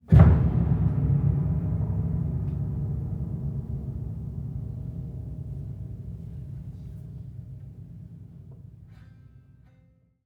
piano string low calm pedal impact, Prepared Piano, upright piano soviet